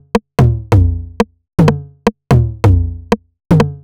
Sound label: drum loop xox percussion 125, Classic Chicago House; Sample Magic; Drum Loops